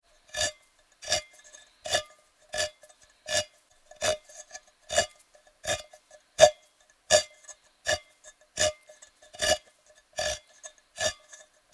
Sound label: Opening tin with hand can opener.; Kitchen; Household